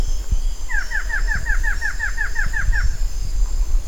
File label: Thamnophilus caerulescens song; VARIABLE ANTSHRIKE; ssp dinelli